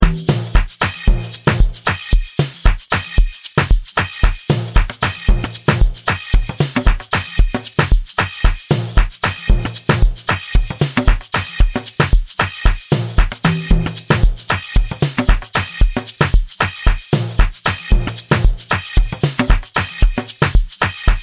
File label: Amapiano Mix